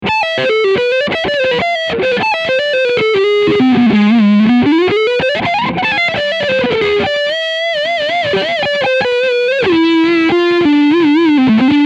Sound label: Leadsound Front PU